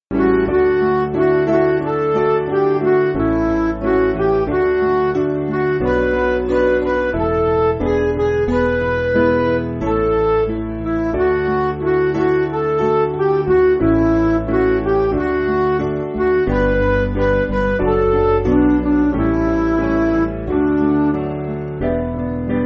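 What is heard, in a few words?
Music Backing Files for Churches; Swedish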